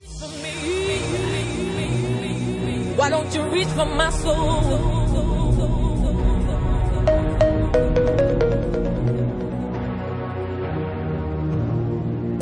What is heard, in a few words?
Bassline Remix